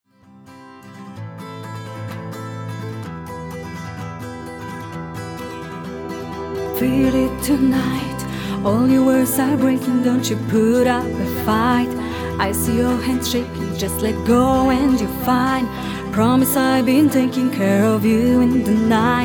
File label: play my drum